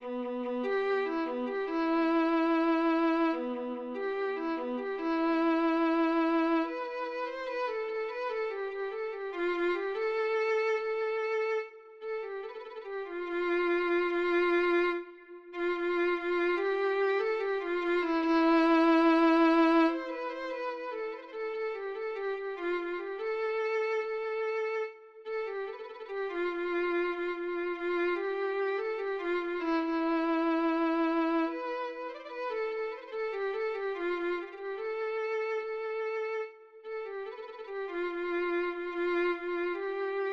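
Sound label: violin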